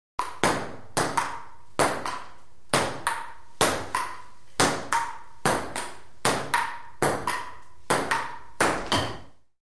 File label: Ping Pong Ball Hit